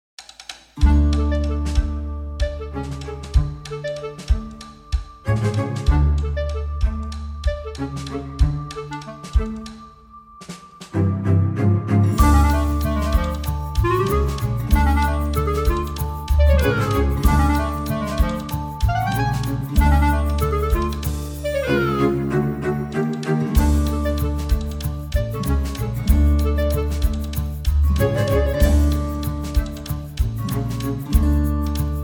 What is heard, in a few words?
Tango